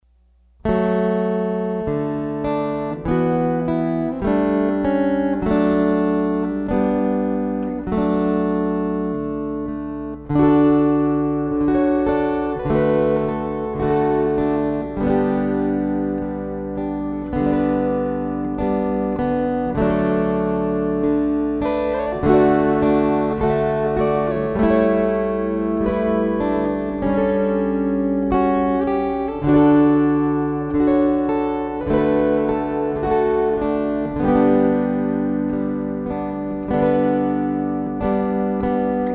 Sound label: String Choir